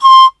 BAMB SH, BAMBOO FLT; Soundscan vol.60; Asian Traditions; AKAI

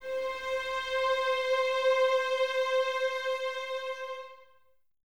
violins C 5, String Ensembles, 23 Violins; Miroslav Vitous

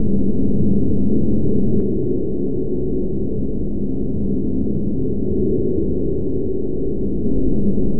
Drone Ambient; Drums & Drones

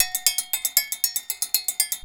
bulu grooves; Best Service; World Colours

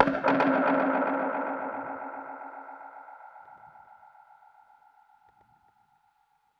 Perc Hit, dub percussion one shots; musicradar

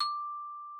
XYLS D6, VIBES; Xsample VOL-4

